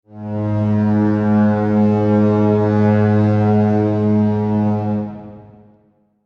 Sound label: Fog horn honk blast ship